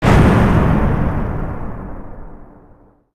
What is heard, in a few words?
explosion